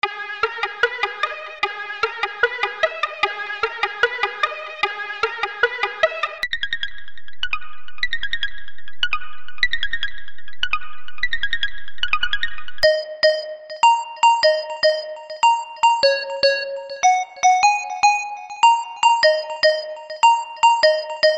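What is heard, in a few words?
trap plucks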